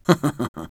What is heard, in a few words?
laugh short, es